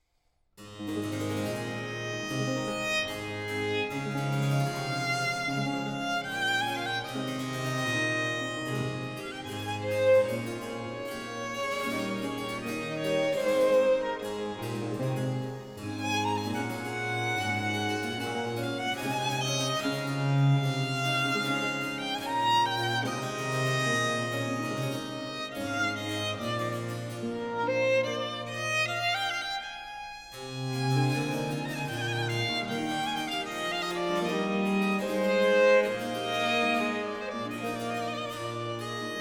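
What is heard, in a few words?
Sonata for Violin and BC in D major Adagio; III.; RV 798; Antonio Vivaldi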